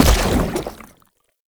Step Default, Footsteps

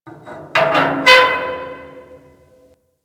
Metal Clunk Squeal; Ambience; Props; Warframe; Orokin Derelict

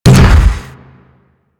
Gas Grenade Launcher; Warframe; Weapons

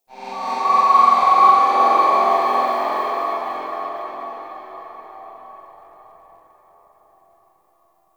Mid Texture; Warframe; Guild

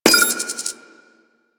Moa Data Pad Press; Warframe; Quests; The New War; Veso

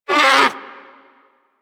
Predator Vocal Pain, Hunting Critters; Warframe

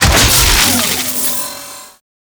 Lightning Deactivate, Forest; Veilbreaker; Grineer Forest Thumper; Kahl

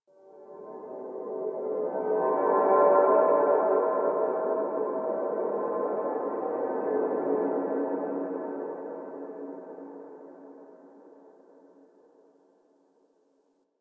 Church Bell; Warframe; Clan Dojo; Dojo Misc; Dagath

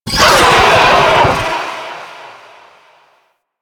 Sentient Vocal Death, Enemies; Warframe; Sentients; Ortholyst

